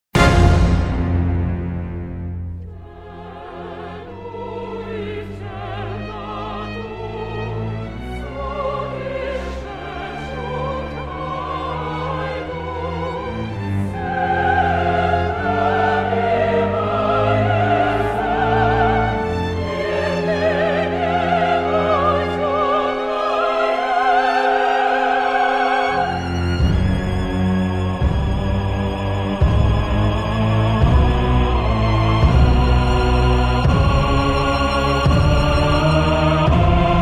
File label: cinematic drama; soundtracks